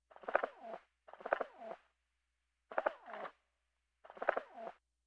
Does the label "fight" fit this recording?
No